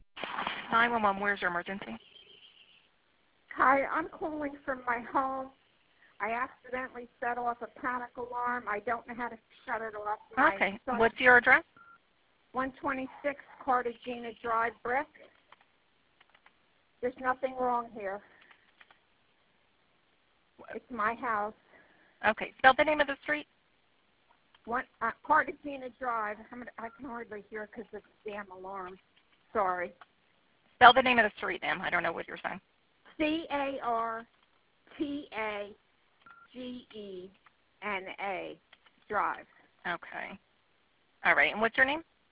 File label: alarm